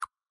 click, phone

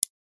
tick, breaking Lock

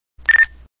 walkie talkie

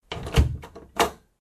close house

